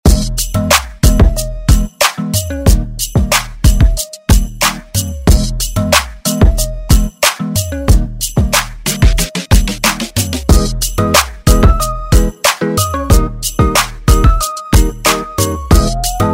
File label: Intro Outro; Redrum